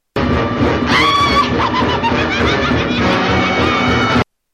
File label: wicked witch laugh